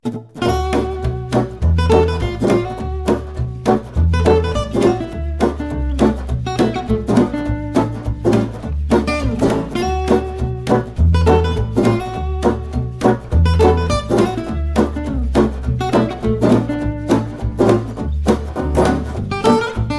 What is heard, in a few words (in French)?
manouch